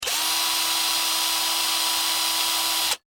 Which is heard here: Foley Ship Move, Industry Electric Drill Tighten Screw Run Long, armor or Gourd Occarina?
Industry Electric Drill Tighten Screw Run Long